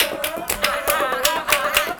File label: CHANT, 120